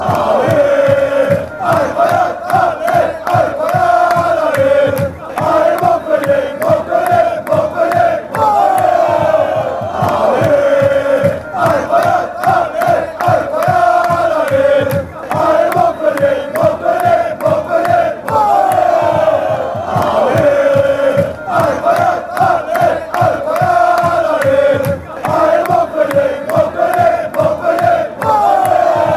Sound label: allez, fan chants; montpellier